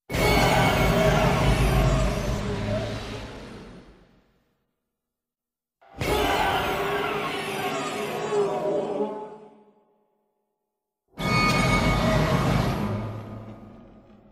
Roar; Kiryu

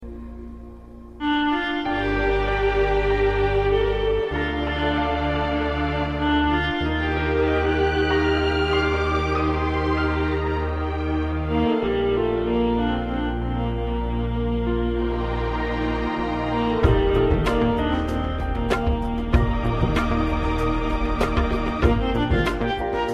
Sad Tune

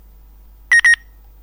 Siemens x45 Ton

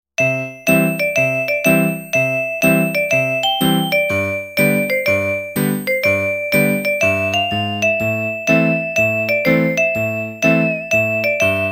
Musicbox

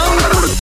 Stamper

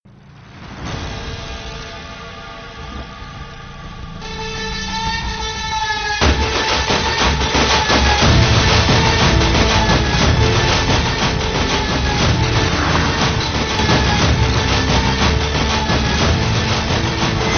climax beat; KGF

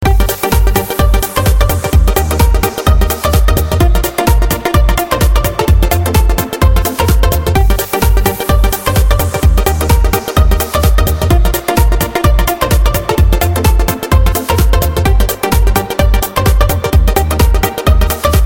Love Beat

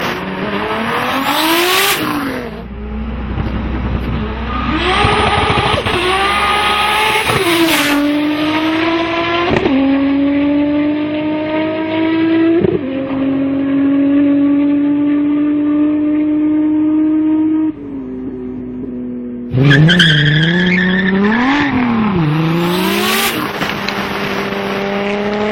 Audi S4 Sound

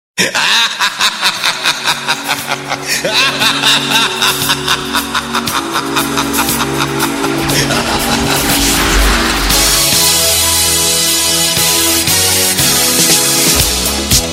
Thriller Tone

